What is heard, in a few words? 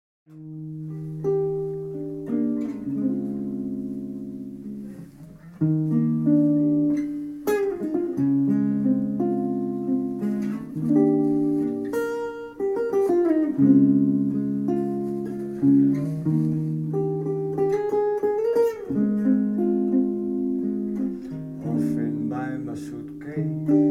Eb Jazz